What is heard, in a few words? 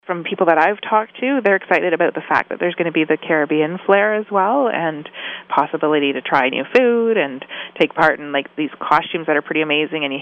Drum; Drum Fest V1